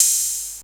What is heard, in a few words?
ride; foxdot default